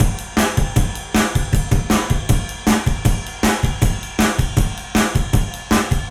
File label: ALTROCKDRUMS